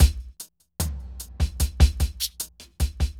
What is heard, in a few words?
evil beats 75